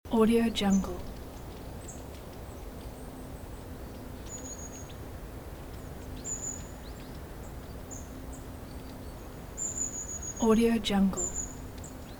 late fall forest ambience loop

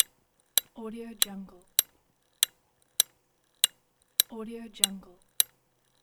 pocket metronome 100bpm ticking

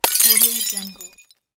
glass break sound

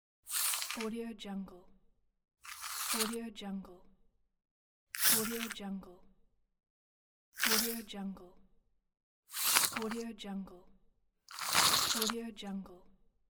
sewing tape measure

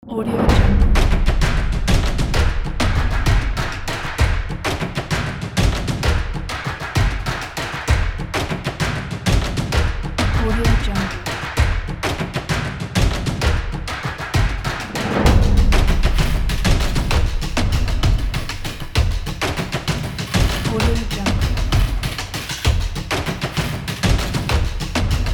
dark percussion

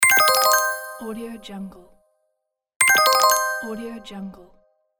strange alert

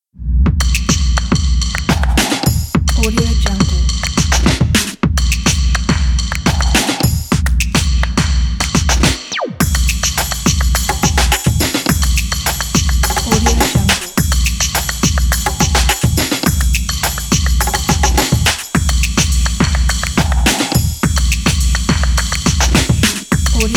in the drums

